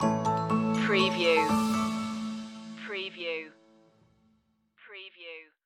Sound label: bell shoppe stinger